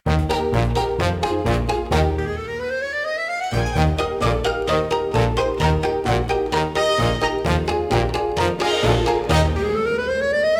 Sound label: Monkey Wobble Overture, comical orchestra for slapstick gag scene